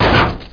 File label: SKD ROCK; BIGRACIN